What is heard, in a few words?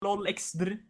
haha lol xd